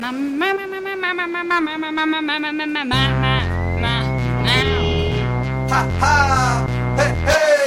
Taxi Humm

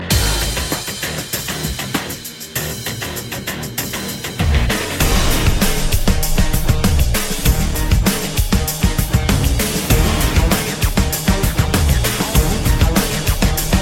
Supper Beats

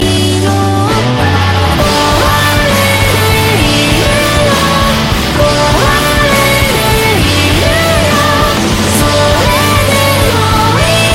vocaloid

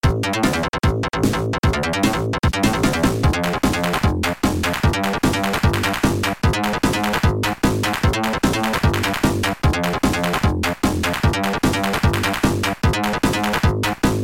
Drum

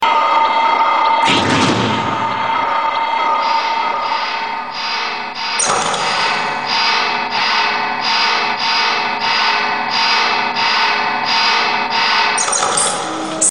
Letter Tone; Vikraalz

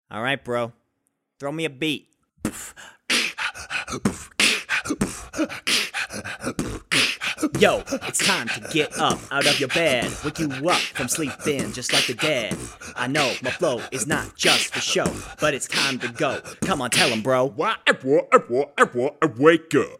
Alarm

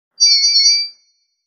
Ringtone

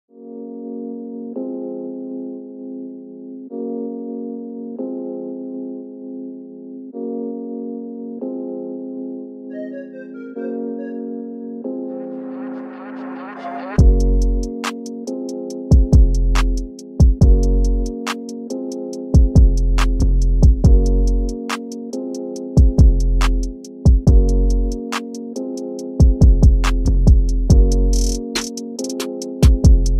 Beat

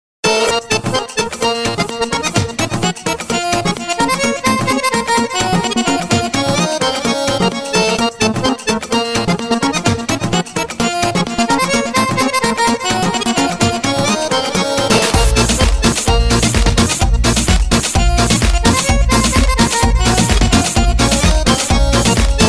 Akordeon Mix

